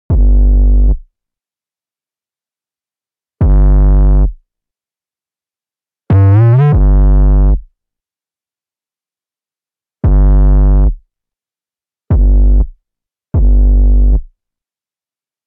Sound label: 808 Bass with saturation; 加入饱和效果后的808低音